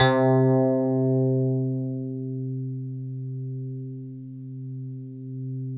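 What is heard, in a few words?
FM electric piano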